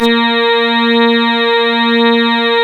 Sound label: ORGAN, A#; Big Fish Audio; Synclav FM